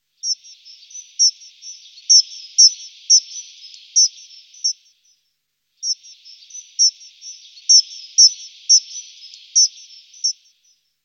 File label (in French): Accenteur cri